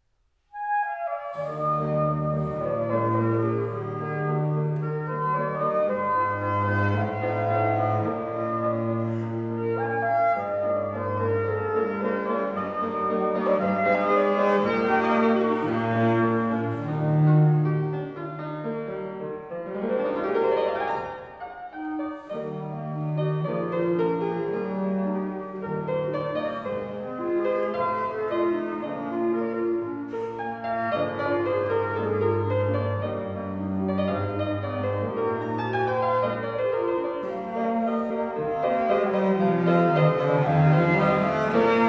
Trio for Clarinet Cello and Piano Allegro Moderato